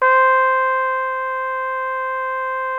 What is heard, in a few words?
BRASS 2 C4, ORCH BRASS; Greytsounds VOL-1